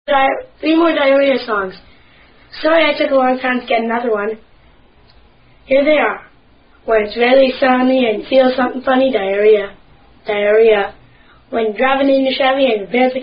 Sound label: Diarrhea Song